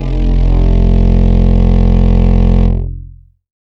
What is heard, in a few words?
Synth Bass 5 F; Sonic Foundry (Sony Creative Software); Ugly Remnants VOL-2; Basstuff